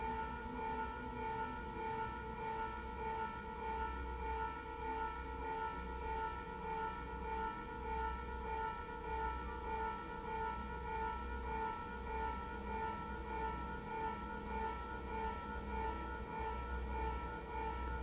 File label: train arriving